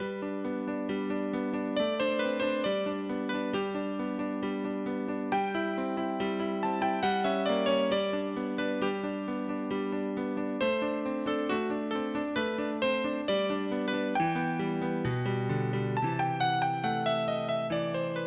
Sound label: piano; Casino Inc